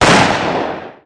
pisztoly; Pure Pinball

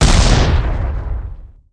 tank ágyú; Pure Pinball

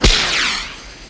hatso kapu; Pure Pinball

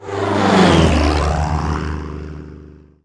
repulo; Pure Pinball

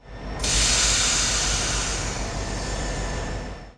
Mozdony Pihenes; Pure Pinball

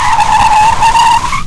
fekezes; Pure Pinball

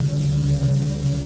senzor; Pure Pinball

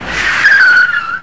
fek; Pure Pinball

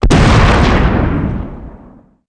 agyu; Pure Pinball

